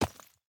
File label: ore, footsteps; immersive sounds